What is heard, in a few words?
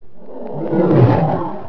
swipe yell; valve; bullchicken